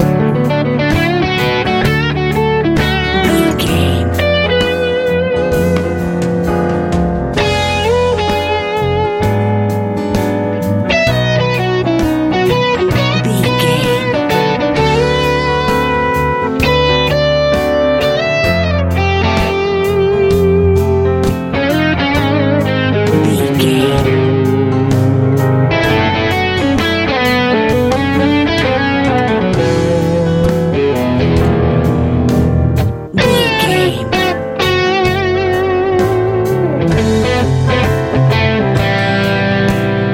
fun big hot airplane ride love easy pop

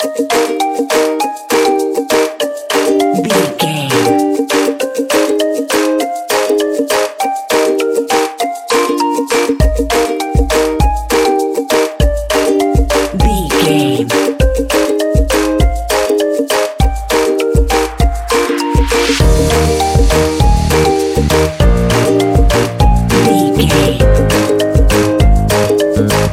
positive holiday

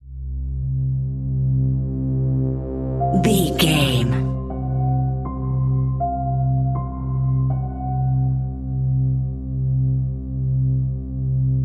ominous

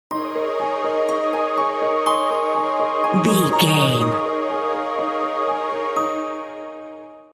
enchanted magical forest stinger

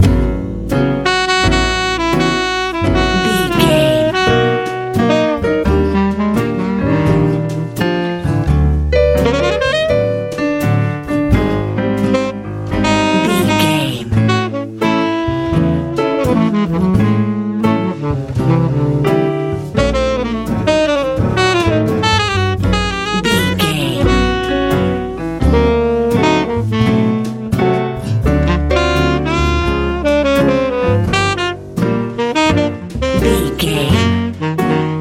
easy jazz

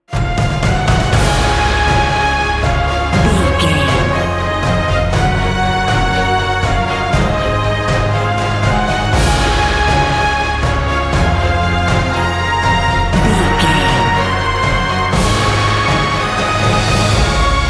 sunshine morning cinematic corporate

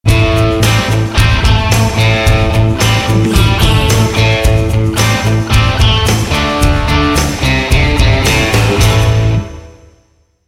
highway rock bumper

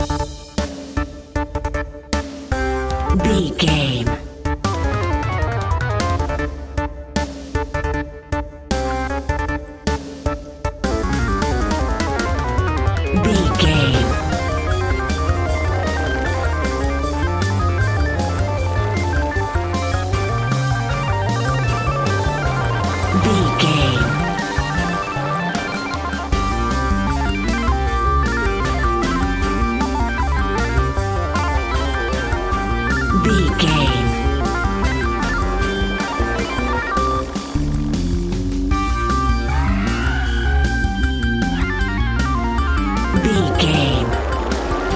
video game boss battle

